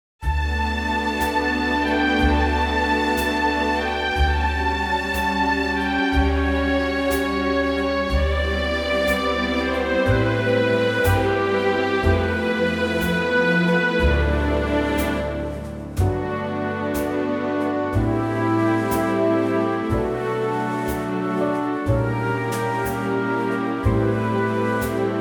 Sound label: G orchestral; key - G - vocal range - G to B